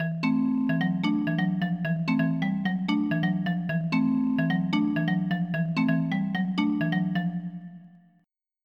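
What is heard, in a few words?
marimba; Roland - An Invitation To The Roland World Of Music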